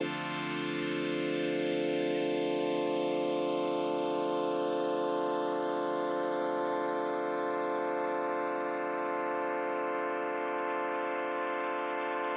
Boss DE 200 Digital Delay 160ms Juno 6 moduliert inv Phase